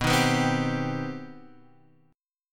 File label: C Minor Major 7th B